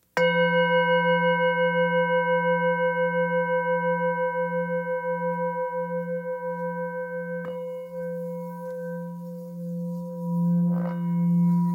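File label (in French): Bol Fullmoon Fa, bols chantants tibétains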